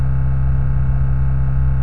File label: transformer, ambient